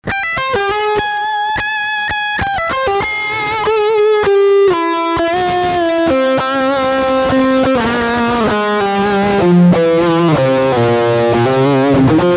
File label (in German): Leadline Steghumbucker